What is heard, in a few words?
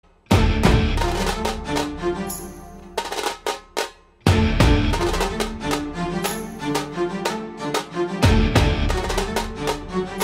Dhol Tasha Cover Indian Version